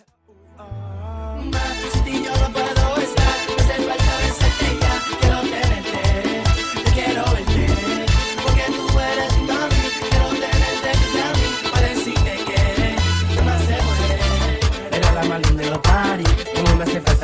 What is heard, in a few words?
latin explosion; multitrax